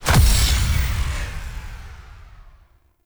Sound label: Energy Ball Explosion Burst; Warframe; Corpus; Mine Osprey